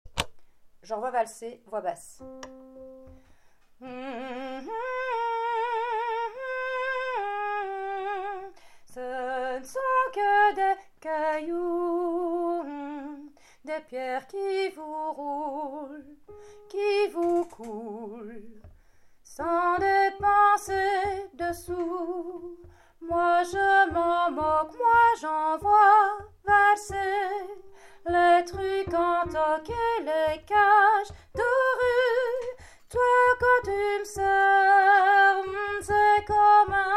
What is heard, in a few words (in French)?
Basse